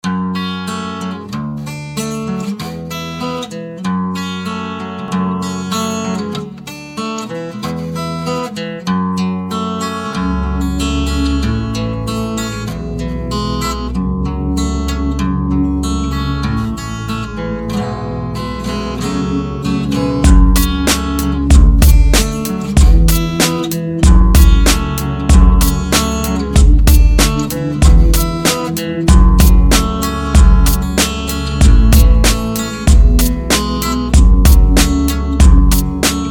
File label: Stalker campfire remix; fan music